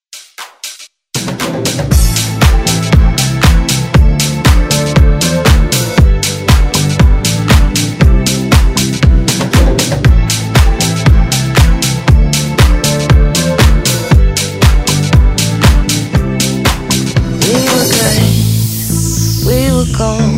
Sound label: House Redrum